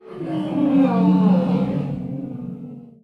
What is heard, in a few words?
Haunted House Sound